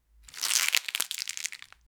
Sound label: stereo asmr recording of, crunch